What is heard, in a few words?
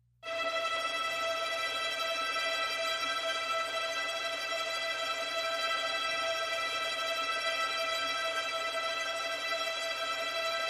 Tango, Strings Only